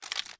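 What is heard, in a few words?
Inspect Movement; Valorant; frenzy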